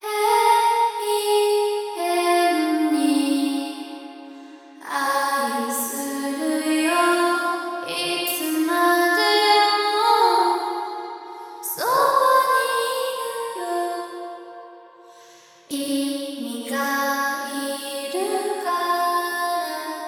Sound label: vocals